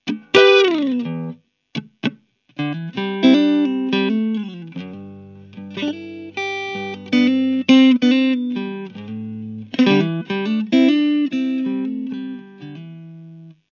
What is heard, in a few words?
Strat Clean